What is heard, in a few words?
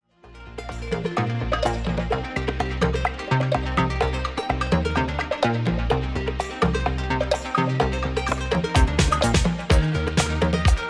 Instrumental